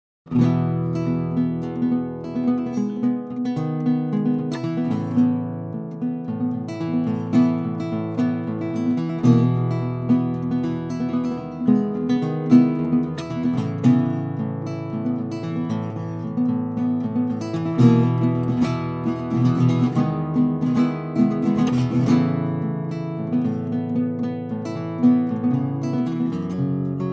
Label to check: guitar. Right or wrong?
Right